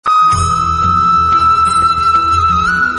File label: Flute Sound Notification Ringtone; Krishna